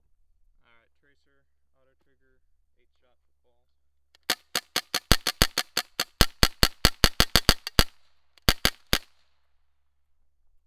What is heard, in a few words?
trracer auto trigger, guns; trracer raw autotrigger some clip